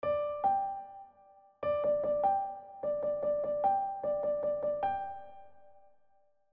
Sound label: mambo